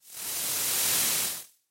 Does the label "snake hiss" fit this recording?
Yes